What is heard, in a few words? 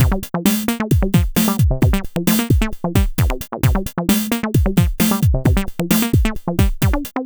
Dance Drumloop; Drums; Dance Beats